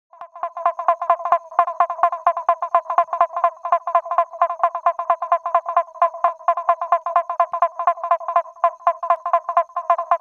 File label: Physalaemus nattereri